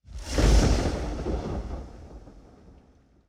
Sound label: Thunder Sweep Synthesized; Thunder & Lightning